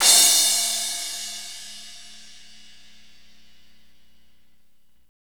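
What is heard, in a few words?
cymbal crash, fast rock, Roland; Northstar; Drumscapes Roland; Cymbals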